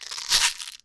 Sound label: box rattle; Kenya